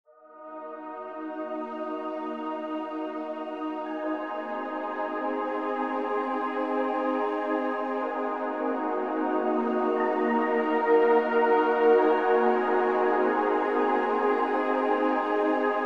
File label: golden pad